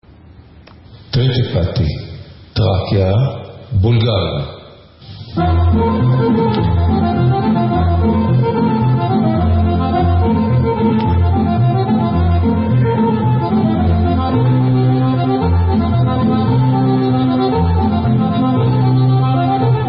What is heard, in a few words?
Bulgarian Instrumental